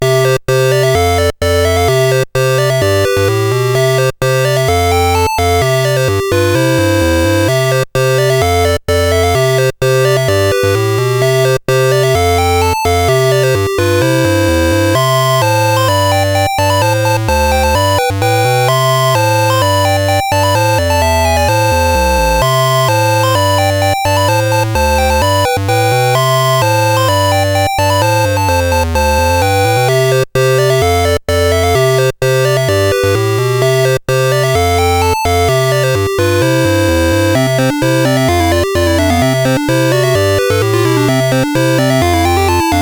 Dragonworld sid CSG8580R5

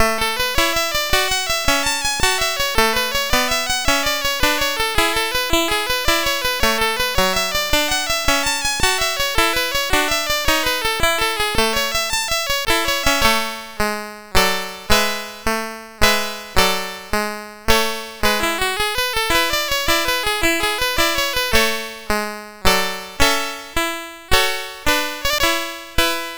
SX 64 Demo sid CSG8580R5; Commodore

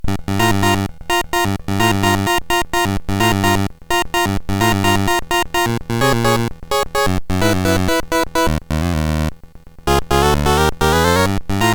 Honk, CSG8580R5

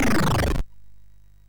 sid MOS6581R2, GAMES; Catalypse

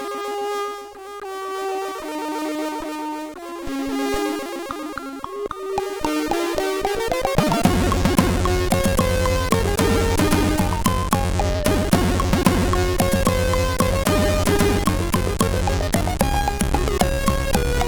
Orch Intro, CSG8580R5

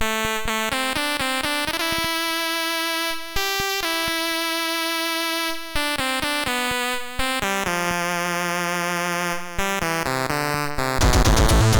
Saxophone, sid; CSG8580R5

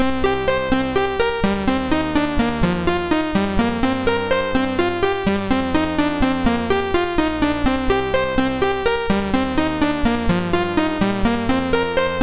Bass, MOS6581R2; Etwas; Snabel

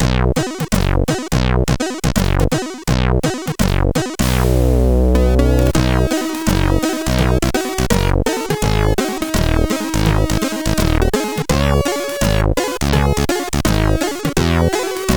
Jazz, CSG8580R5

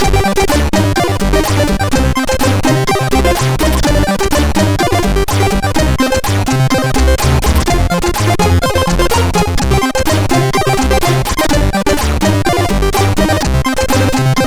Tetris, sid MOS6581R2

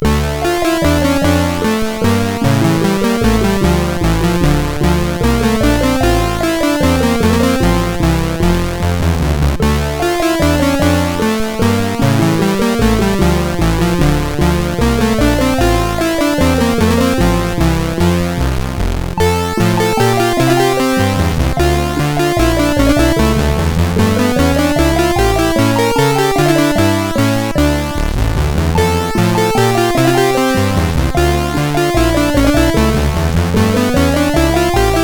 Menuet D-moll, CSG8580R5; hvsc; Froyd

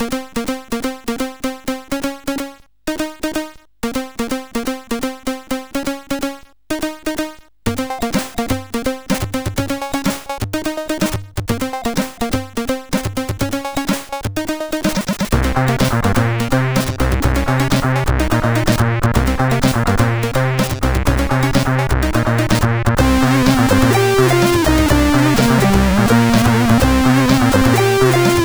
sid, CSG8580R5; Minimal Subject

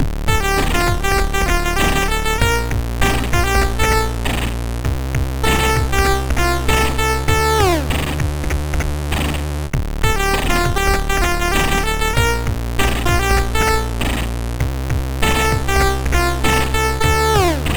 sid MOS6581R3; Straight Up

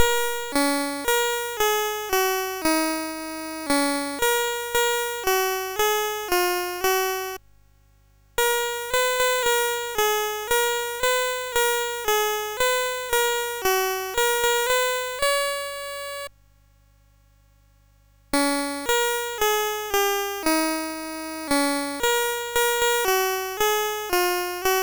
Banana Boat, MOS6581R4; Music Shop

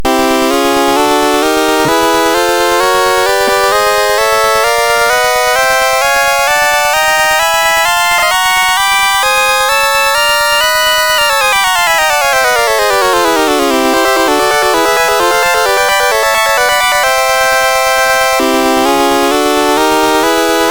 Major, sid MOS6581R3; hvsc

